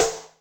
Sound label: RIM popr, Electric Snare, Yamaha DTXpress